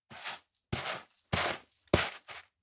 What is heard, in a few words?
steps outdoor beach walk in; aino; anemoi